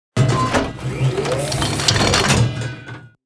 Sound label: reload, tank